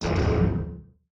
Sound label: distance disruptor hit, weapons; Stellaris